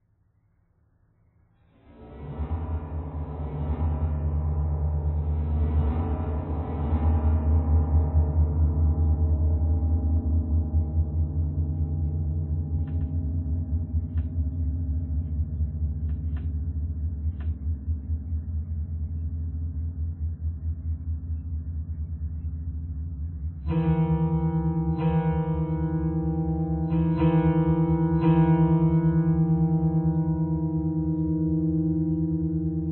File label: piano noise